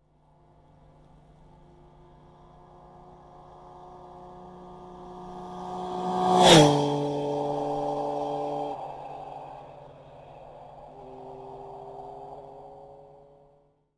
Benelli

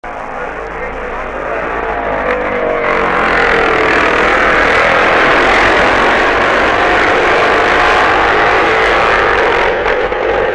Norton Williams; 1966; 1966 Norton Wiliams

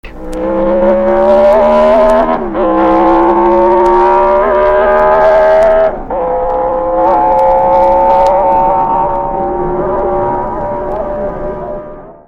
Guzzi V8

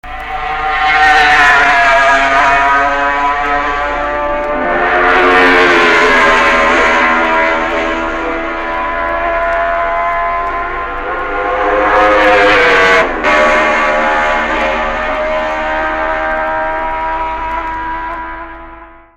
Honda 250; 1960 Honda 250 4

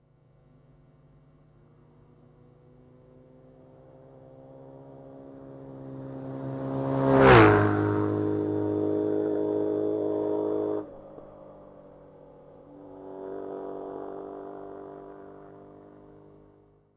BMW R1150GS, bike

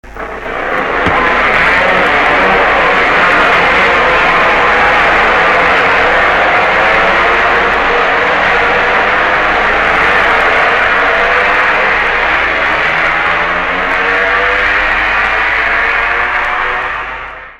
50 start; 1966 50cc Start

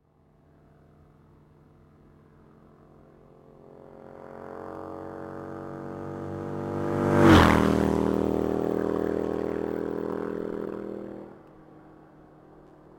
Harley Sportster; Harley Sporster

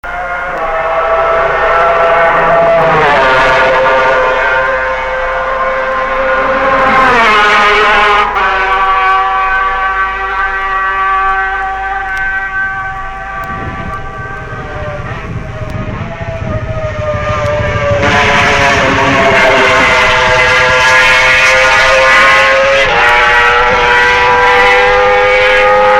Yamaha Honda 125, vintage bike; 1965 Yamaha Honda 125

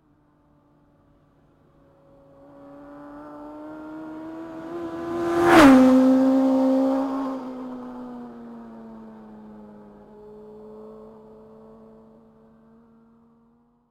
Honda Fireblade, bike

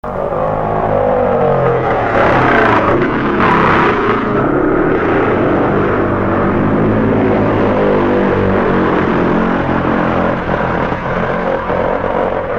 G50 Matchless; 1964

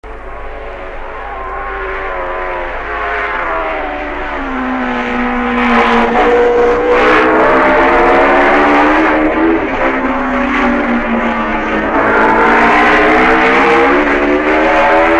Gillera MV, vintage bike; 1963 Gilera MV

